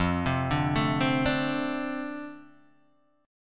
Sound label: F 6th F down Guitar Open D